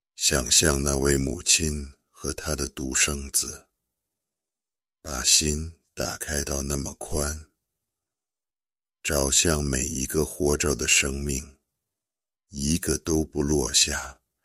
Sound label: drop, remix